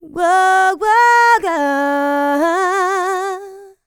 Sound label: LICKS, Vocal Planet, R&B; ILIO; Dance; LT R+B